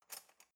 cutlery knife drawer grab soft

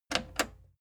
plastic button slack click slow

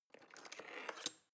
knife wood drawer handle scrape

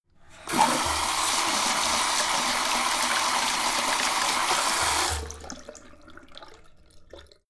sink fast flow wash turn off on

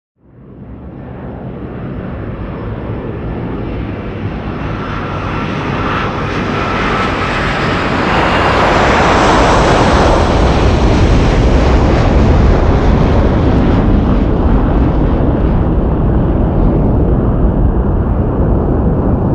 jet passing

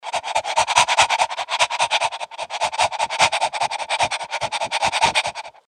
dog hatchel

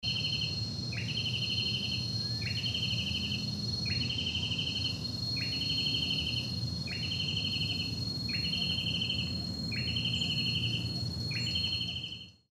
rain forest bird singing

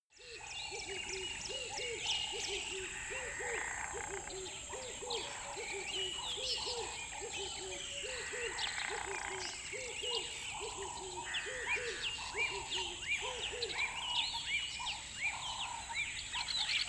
river bed dawn chirp soft

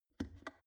plastic water boiler close lid soft

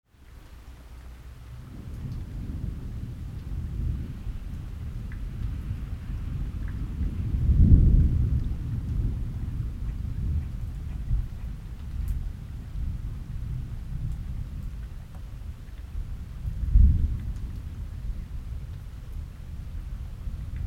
rain thunder and storm

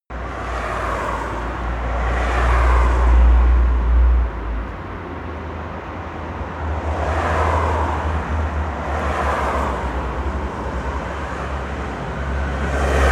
the streets

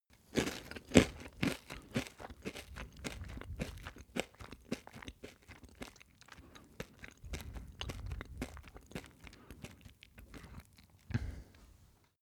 male close eat cereals

